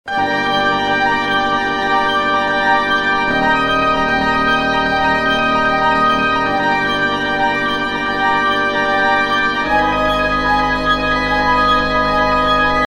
orchestration unison woodwinds, the woodwinds; epic orchestral track

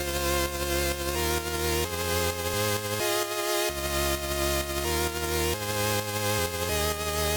sidechain melody; Effectrix; EDM

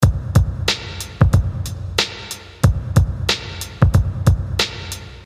Loop, dusty drums; FL Studio

